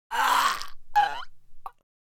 Man choking

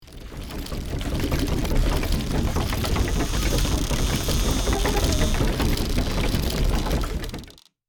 Medieval castle drawbridge retracting

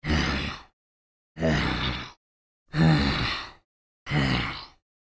Mobile game character breath; video game

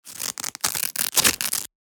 Velcro small patch tearing

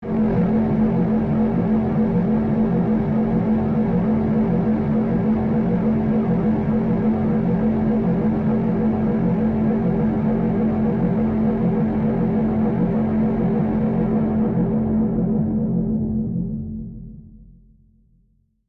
Drone dark industrial ambience

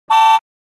Toy car horn

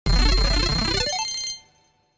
Casino slot machine medium reward prize

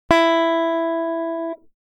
Squier telecaster E high string

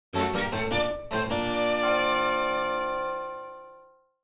Mobile game spooky mystery victory level complete

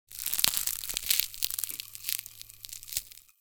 Ice instant freeze freezing crunch

